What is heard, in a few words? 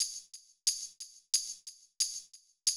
Classic DrumLoops